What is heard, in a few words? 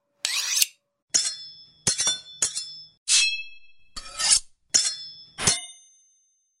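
blades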